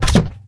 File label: crossbow firing; 3D Game Programming All in One; KOOB